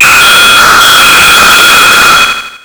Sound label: Blizzard; RPG